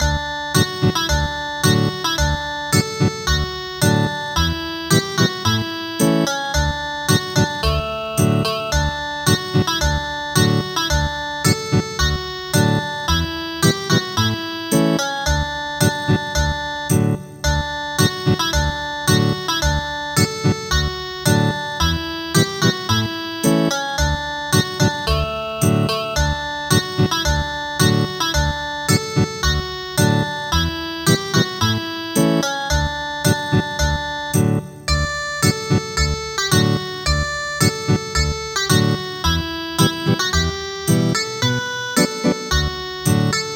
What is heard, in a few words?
Village dance Ethnic Guitar; traditional; gangar